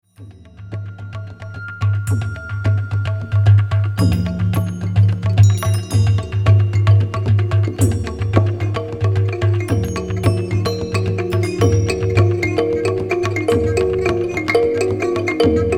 total art of percussion

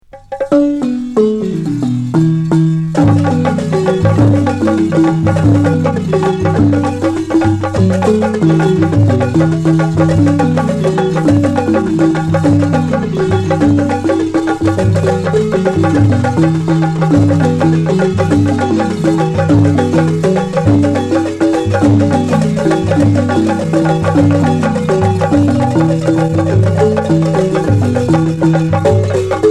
senufo music aphon

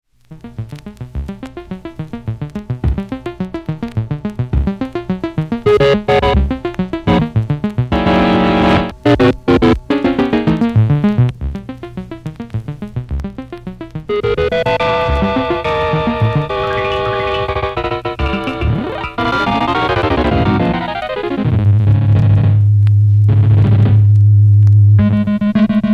music by computers

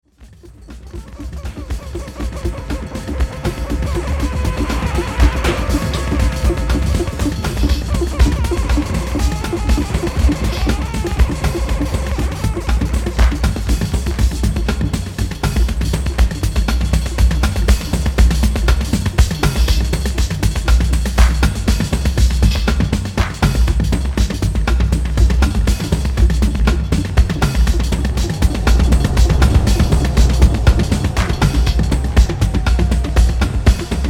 rhythm and noise

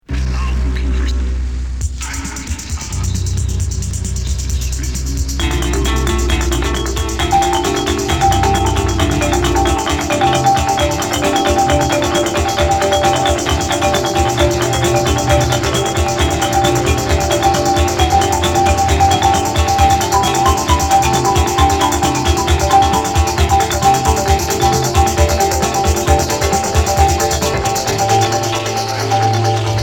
rimarimba on dry

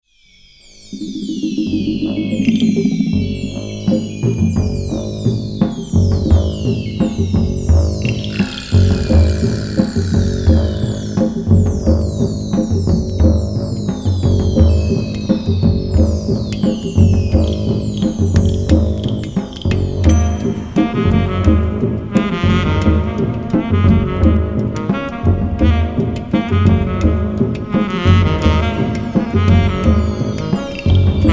oriental wind

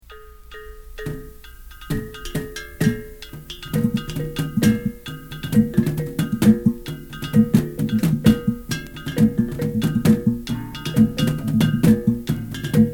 philippine gong

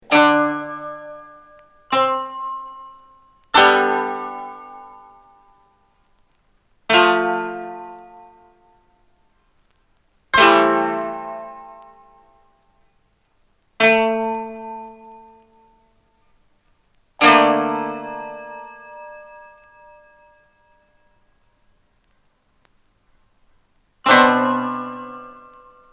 mega wave orchestra

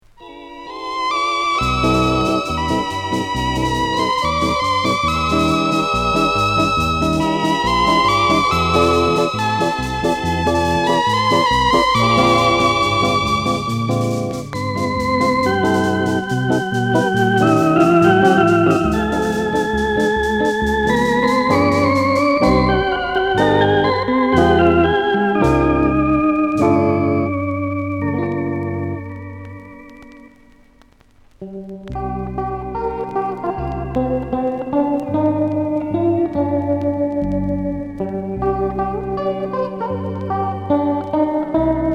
orchestra

